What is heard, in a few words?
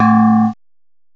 STEEL DR, MALLETS; M8; CMI Factory